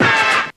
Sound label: slapped, stabs